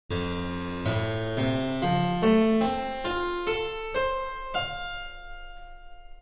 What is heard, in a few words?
harps